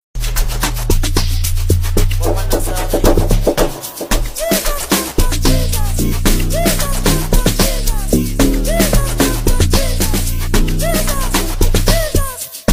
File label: Amapiano